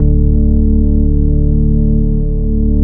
Bass Analog & Synth; PERHAPS; USB Soundscan vol.12; AKAI

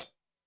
closed hihat, Zoom MRT-3B

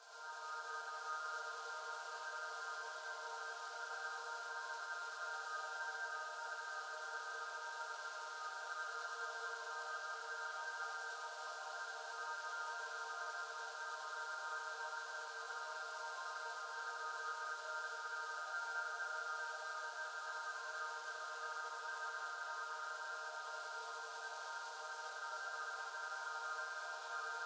drone pad

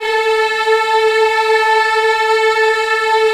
A3 WOMEN EH, Choir Acoustic & Synth; USB Soundscan vol.28; AKAI

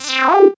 syn, Dance Synths, Wasps; ProSamples vol.37

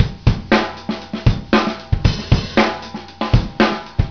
Unprocessed Beats; RnB; GeorgePendergast

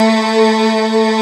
GLASS A A2, D50; D50 STORY; East West; Global Sounds

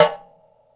Rocket Tom, Tom One-Shots; KJ Sawka - Drum n Bass & Beyond; Drum Tool Box

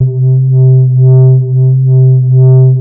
MOOG BASS C4; Best Service Dream Experience

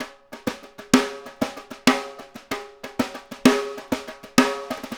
Snare Pattern, Drums; Essential Percussion

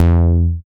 TITE BASS; Masterbits; Dance Trax

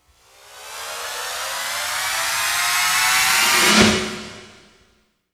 ASCEND HIT; ILIO; Trance Fusion

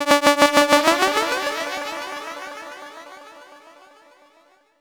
EMS VCS-3 FX; Drums

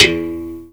Berimbau; BERIMBAUS; Heart of Africa

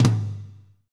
TOM, Toms, Roland; Northstar - Drumscapes Roland

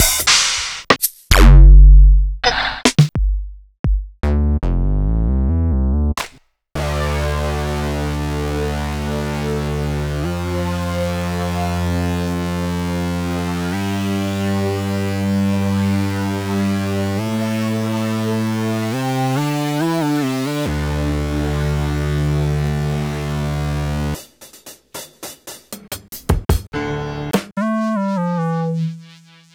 Akai MPC5000 Internal Rom

